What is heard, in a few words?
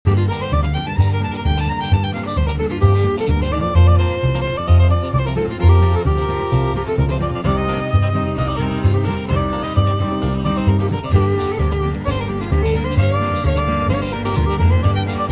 On Hold Jazz